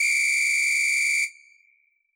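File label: samba whistle high, Yamaha DJX Rock Kit; 144 Rock Kit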